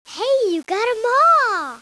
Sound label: WIN